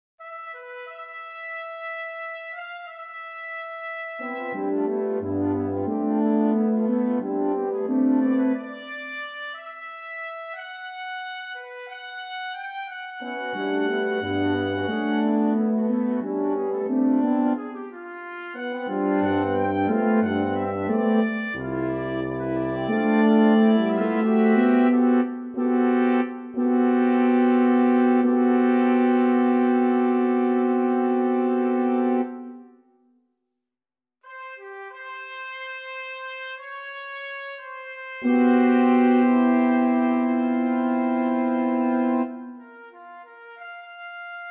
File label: Air Force Brass beginning